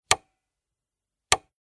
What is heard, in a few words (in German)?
Ein und Ausschaltknopf, Radiogerät Crosley Mod. 11-105 U; 1950